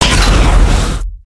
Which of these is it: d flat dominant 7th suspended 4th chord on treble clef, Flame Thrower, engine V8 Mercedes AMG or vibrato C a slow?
Flame Thrower